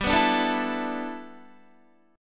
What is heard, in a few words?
G 6th Add 9th G Guitar, chord; G6add9 strummed